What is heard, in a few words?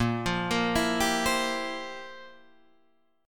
F Major 7th Suspended 2nd Suspended 4th, Bb, guitar